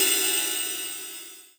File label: One Shot Ride, Drum N Bass - Percussion; Sonic Foundry (Sony Creative Software); Knutrix Drum & Bass